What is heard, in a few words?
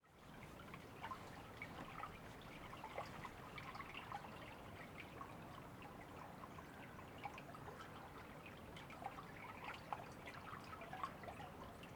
calm river